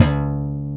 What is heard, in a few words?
BS BS. GTR, Soul E. Bass; Roland - Rhythm Section